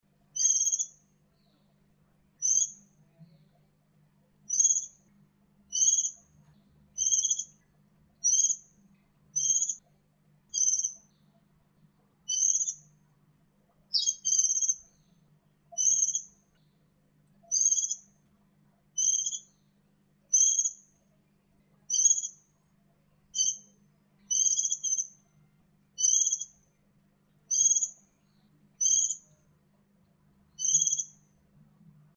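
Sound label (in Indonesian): pleci betina, Indonesian bird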